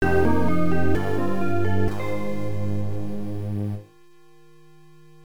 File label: Scenario Clear; Sorcerian; MSX2; OPLL